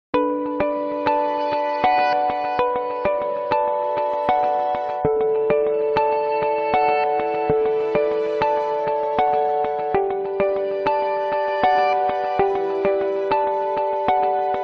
Samsung Galaxy S7

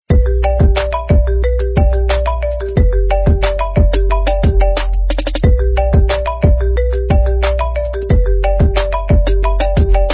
African Remix

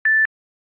Beep Once

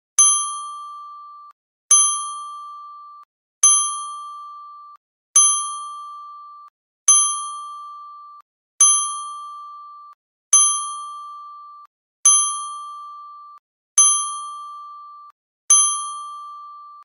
Klink Klink

